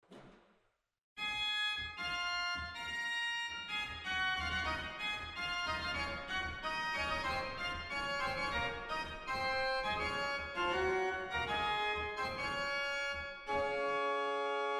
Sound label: Mixtur Bass; waltershausen